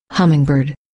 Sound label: hummingbird; wild birds